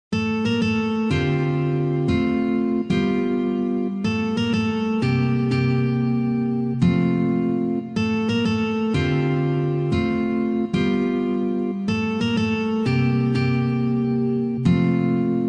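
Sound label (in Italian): arrangiamento per chitarra sola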